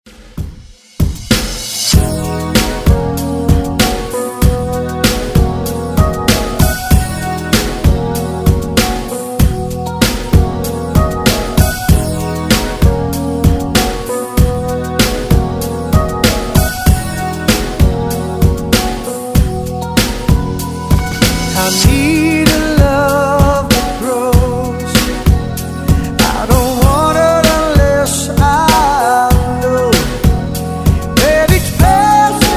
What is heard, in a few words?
80s Redrum